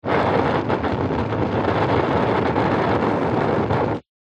nature fire flames small burn wind blown then out short